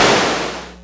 Clapbox Noise; Ableton Foundation FreePacks

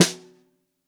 SN2 Bottom, Snare; Ableton Foundation; Snare Designer

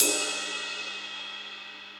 Ride; Session Drums Studio; Drum Hits; MPE Hits; CopStyle MPE

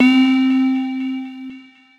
Bells, Retro Synths; CZ MemoryBells; Ableton; Phazeform Vol 1

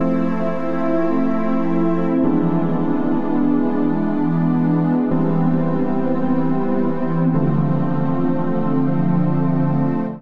Padz C Major 94 bpm; Padz Tapz; Accompaniment; Tonal; MIDI Clips; Drive and Glow; Ableton; Factory Packs

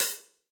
Hihat Closed Warm And Low; Drum Hits; Standard Hits; Ableton; Factory Packs; Session Drums Club

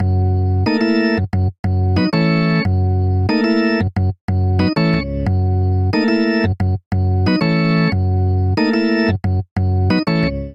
Vamp Rolling Hip Hop Organ Gb7#9 - Gbmin7 91 bpm; Electric Keyboards; Ableton; Factory Packs

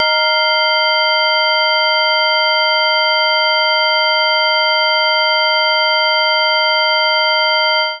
Fifths Cluster C-1-D7; Notes and Clusters

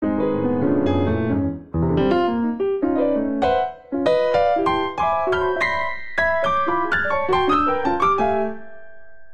Phrase Free Dense 77 bpm, Grand Piano; MIDI Clips; Ableton; Factory Packs

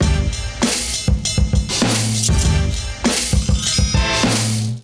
Kit groove 99 bpm, Drums; MIDI Clips; High Rise; Ableton; Chop and Swing

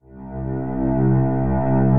Drone; Latch; Ableton; Granulator III; Device Templates

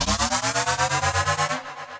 Power Up, Effects; Drive and Glow; Ableton; Factory Packs

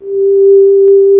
flute G3; Ableton Foundation FreePacks; Foundation Glitch Toys & LoFi

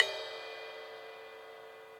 CRASH 18 side stick atmo, Cymbal; Ableton; Factory Packs; Drum Booth

